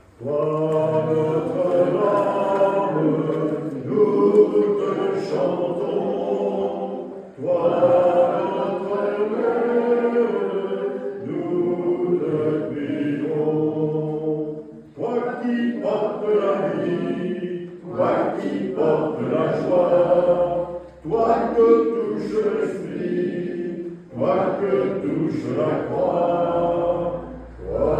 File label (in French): EGLISE TOI NOTRE DAME; VILLEFOLLET 79; ASCENSION